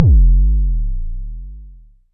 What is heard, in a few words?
m base, Jomox Mbase01